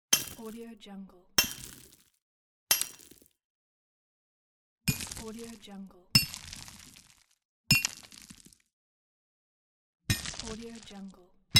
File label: mining